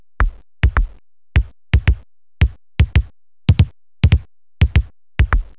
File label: alternate bass, drumtraks